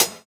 Closed Hat, JUNGLE BREAKS; ORIGINAL JUNGLE BREAKS; JBK DRUM SOUNDS; JBK HATS

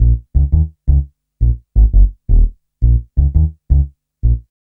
Upright Bass, Drum & Bass; Fluid Dynamics

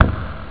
tong; Amiga Soundtracker sample

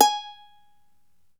uke G#4

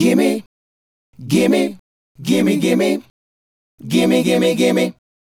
Male Group, Hip-Hop and RnB Vocals

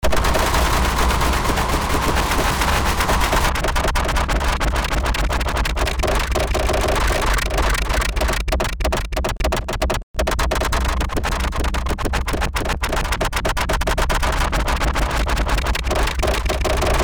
granulized portal sound

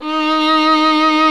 SOLO STRINGS

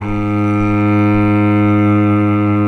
G#1 SOLO CELLO

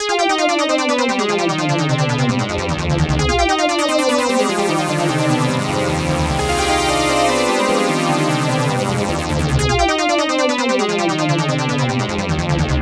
FILTERFUNKAR, ARPEGGIO 150; Masterbits - Tekkno Trax

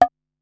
Conga High, Korg Wavestation-SR, Drum Kit; Wave 455 - Drum Kit

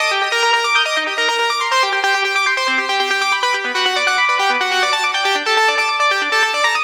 NRG Arp, trance

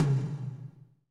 TOM TOM, Roland

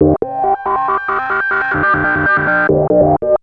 Atonaloop, Industrial Analog

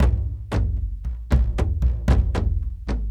Kendong, World Percussion; Brekete

